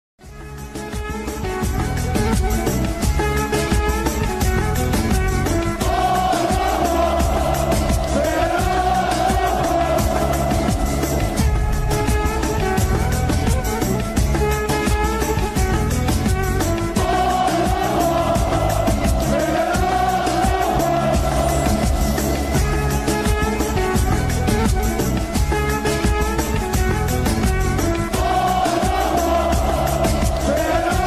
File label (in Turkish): fener aglama melodisi